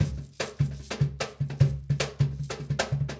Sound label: ESSENTIAL PERCUSSION